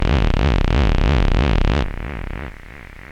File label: monodelay C 90, Recorded with monotron delay and monotron - analogue ribbon synthesizer; instruments; loops